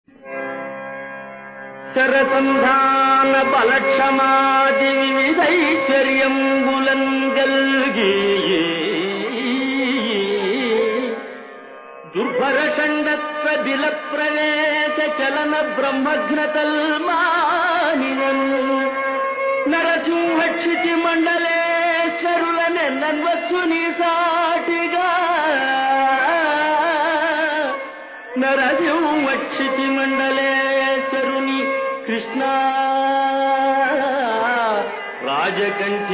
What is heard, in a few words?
Padyam; TenaliRamakrishna